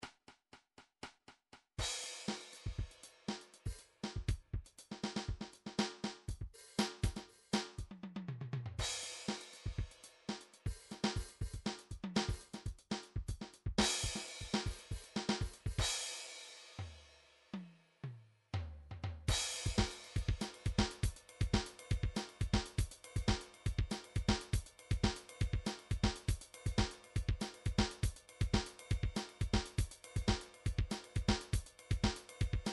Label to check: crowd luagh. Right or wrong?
Wrong